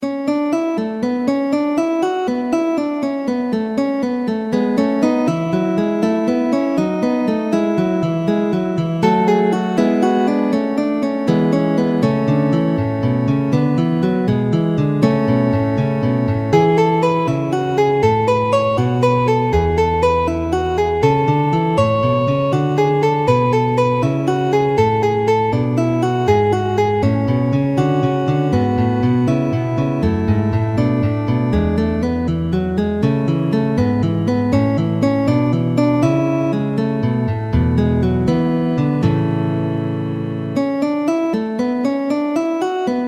pequena fuga; zipoli domenico

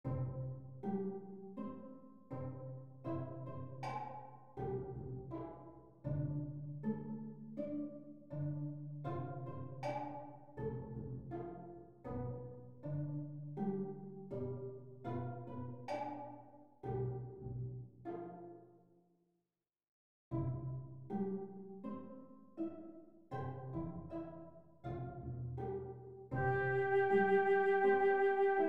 sexteto con claves; jose becerril

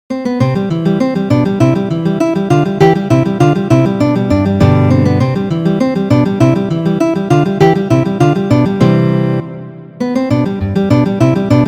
contradanzas; Ferdinando Carulli

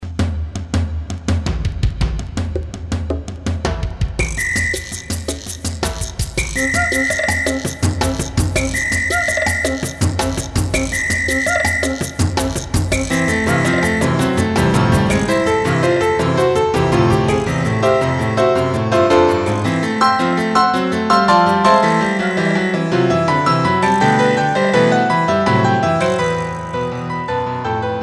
para piano percusion; cinco piezas latinas

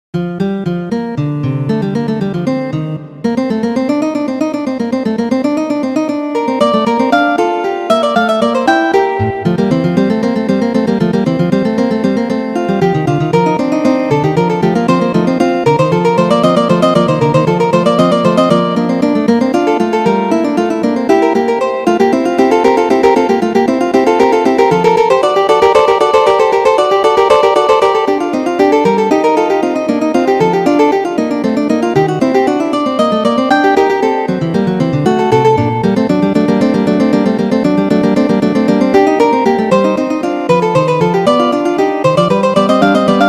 fuga; bach johann sebastian; clave bien temperado 2

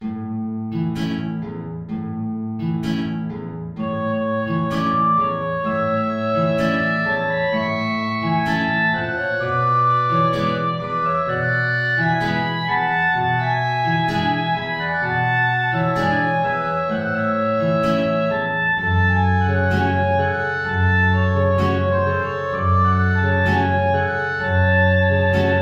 tango

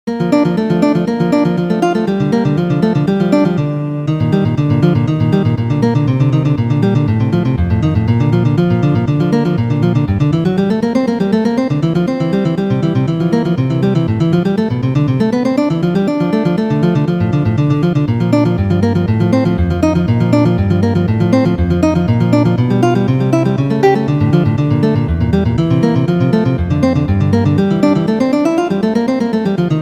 clave; bach johann sebastian; bien temperado 1 preludio

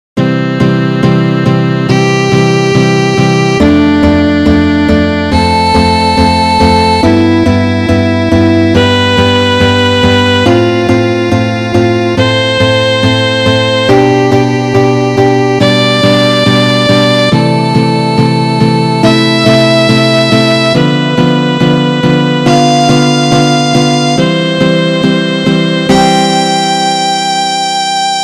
ejercicios; Ferdinando Carulli

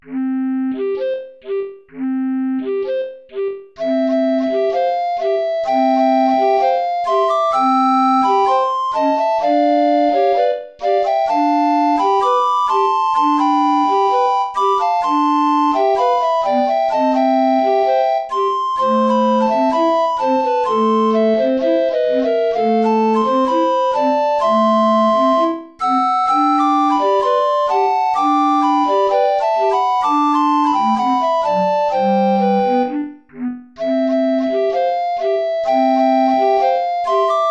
tango

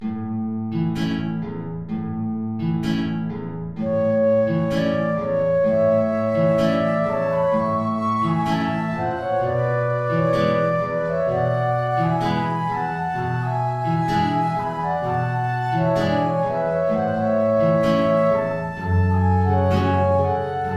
tango

